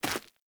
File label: Corrupted Sproutfolk Grunt Step Default; Enemy; Footsteps